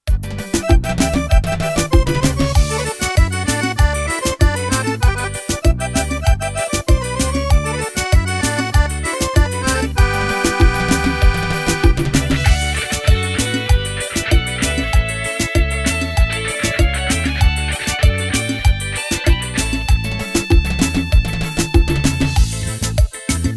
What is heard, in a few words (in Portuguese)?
ritmos korg pa500